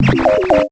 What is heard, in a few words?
message; SieJC